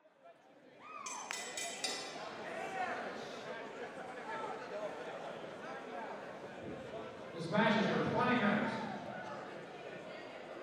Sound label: wrestling start new match